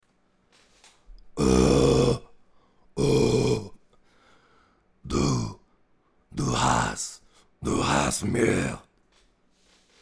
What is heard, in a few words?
Grunt male